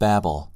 babble

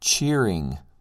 cheering

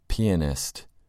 pianist

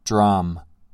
drum

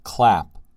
clap

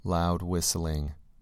loud whistling

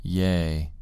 yay

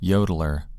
yodeler

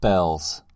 bells

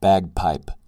bagpipe

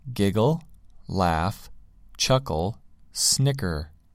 giggle laugh chuckle snicker

